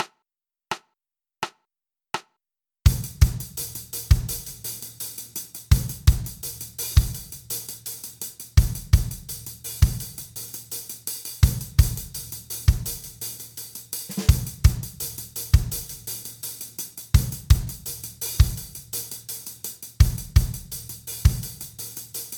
Duo Basse Batterie Batterie

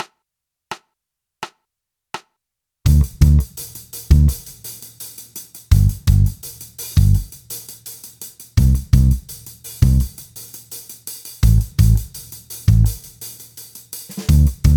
Duo Basse Batterie

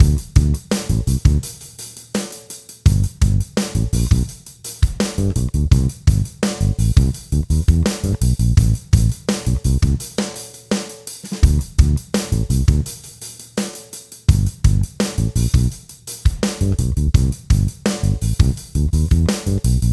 Duo Basse Batterie

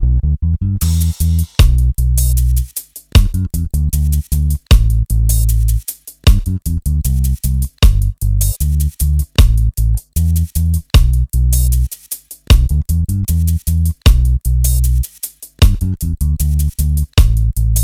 Basse Batterie 154 Bpm

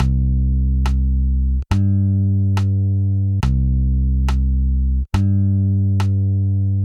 Quinte Juste

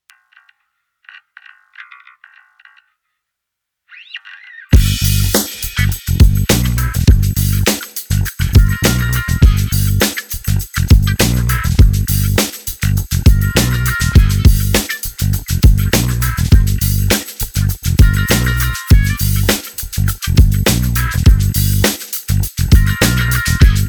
Basse Batterie

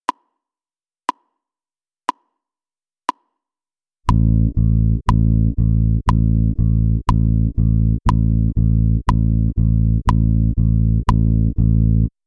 Croche 4-4

